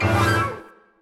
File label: Restaurant - Combo Host Stab; Bee Movie Game